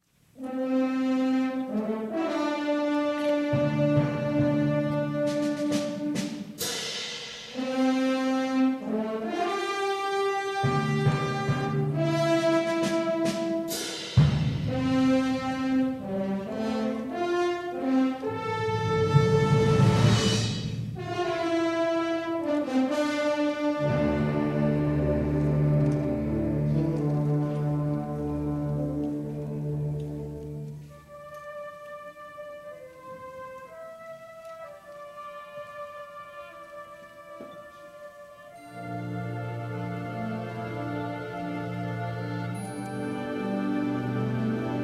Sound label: earth fanfare, 吹奏楽; 吹奏楽楽譜